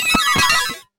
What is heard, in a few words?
chest open; Items & Pickups